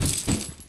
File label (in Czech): retez; Mafie 1